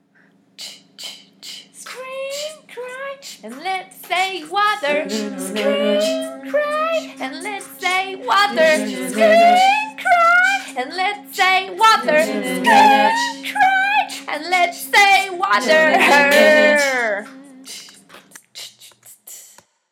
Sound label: scream cry and lets say water